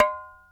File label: bell, Ticks; Misc Percussion